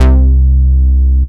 OB BASS, OBERHEIM; Rubber Chicken Software Sample Bank

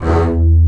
Spicato Basses & Celli; OMI - Universe of Sounds; EII Factory Library

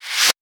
Reverse FX

